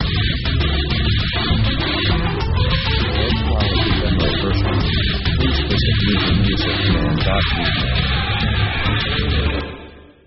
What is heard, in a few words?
metal overdose; power themes